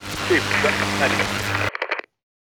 radio cutoff; Counter Strike Model Pack; CS:GO

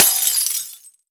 break glass phys small; physics; breaking

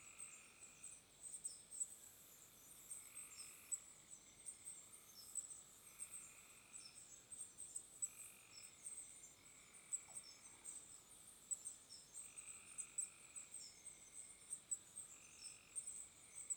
bg swamp; Counter Strike Model Pack; CS:GO; panorama

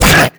attack grunt, headcrab black; creatures; vox

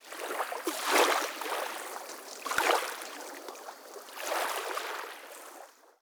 river lap; anubis

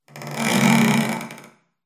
ambient sanctuary wood; alyx sanctuary wood

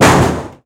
metal sheet impact hard; physics

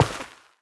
step, footsteps, player, carpet

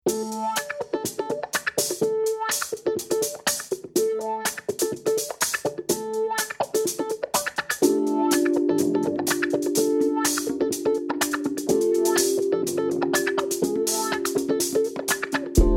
bomb planted

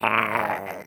mannequin grunt; headcrab classic, scripted; creatures

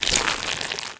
zombie move, foley; creatures; Half Life Model Pack

